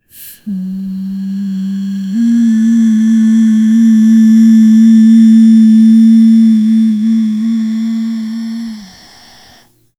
a woman softly says ahhhh; neck

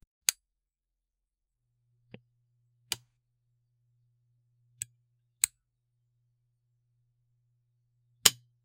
flashlight button click, effects

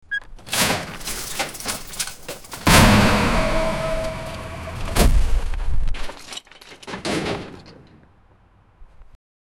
the sound of the opening, dumping

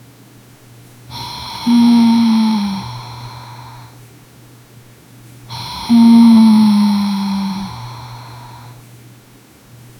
a 17 year old girl is sle, sleep

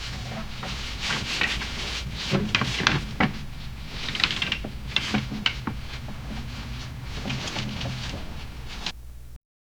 soft shuffle of loose pap

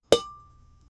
kitchen

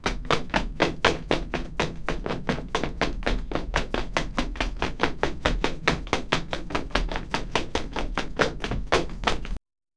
running

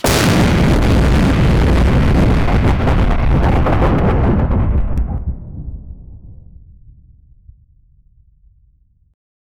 a thunderstorm with warri; fighting

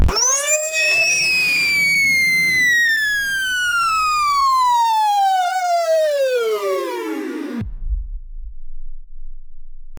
got electric shock

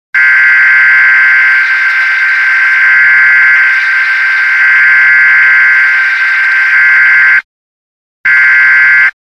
ship red alarm; warning

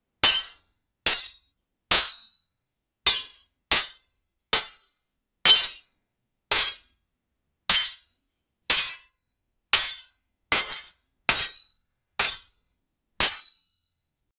two handed sword attack; melee

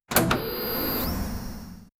futuristic spaceship door, electronic beep

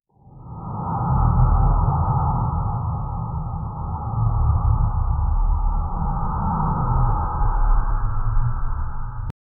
ambient on alien planet, mushrooms

